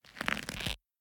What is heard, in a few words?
Leather Foley; Devour; The Town; devour 2021 gamerip pc macos